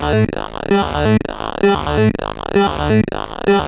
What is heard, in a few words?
MiniBrute Loop, 130BPM